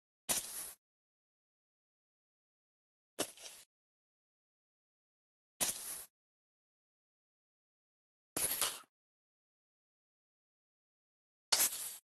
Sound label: Creeper